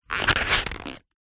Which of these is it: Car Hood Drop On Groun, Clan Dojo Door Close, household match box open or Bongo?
household match box open